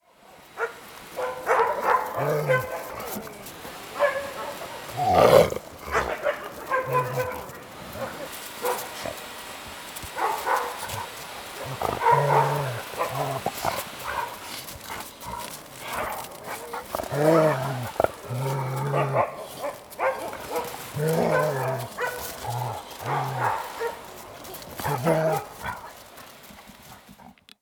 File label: dogs playing, growling